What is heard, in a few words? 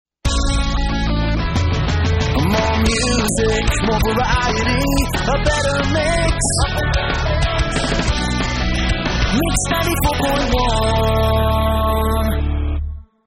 Jingle